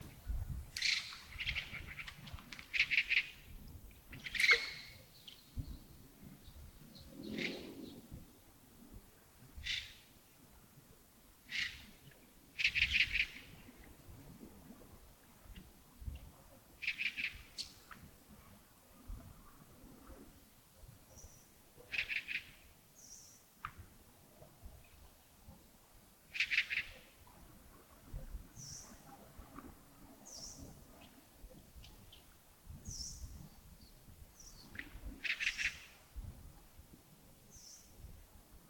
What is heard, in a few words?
birds, 清晨; 亚公顶